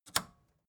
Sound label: record player power push on